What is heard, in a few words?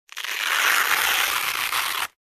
Rip off velcro slowly